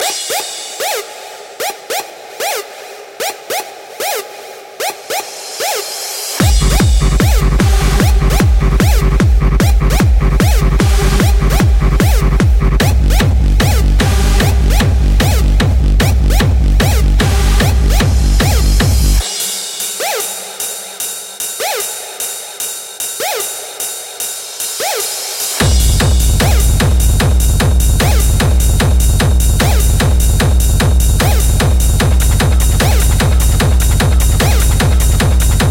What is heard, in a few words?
kicks; NUTTY TRAXX